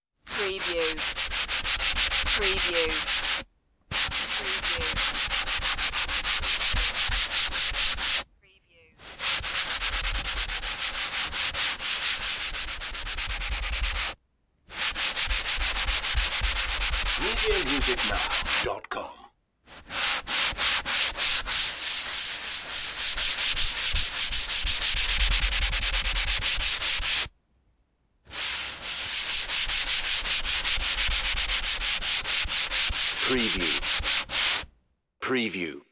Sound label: sponge wipe scrub